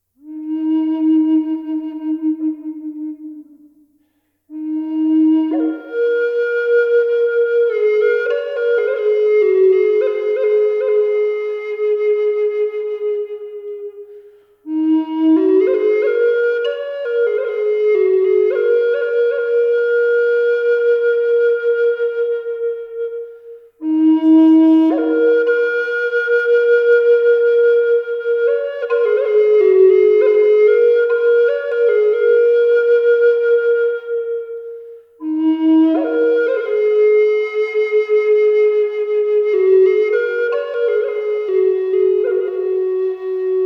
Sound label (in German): Eastern Red Zeder E 432Hz Bergkristall